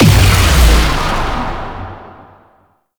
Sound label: Cast Beam Blast; Ultimate Abilities; Zenurik; Warframe; Focus